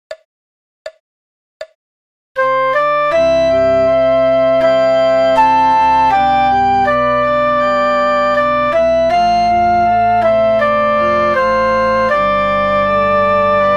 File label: soprano, backing tracks; may the road rise to meet you